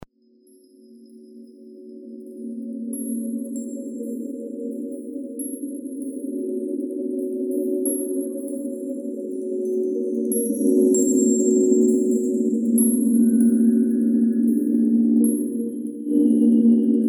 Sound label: angels cry